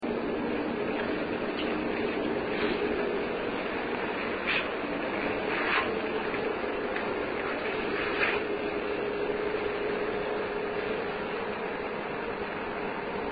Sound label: whispers